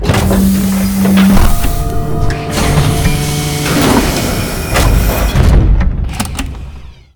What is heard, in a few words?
station airlock; ships misc; CosmicRage; CosmicRageSounds